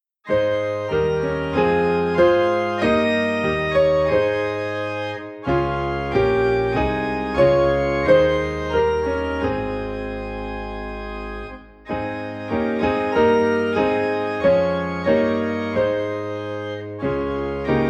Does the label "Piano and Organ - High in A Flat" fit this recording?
Yes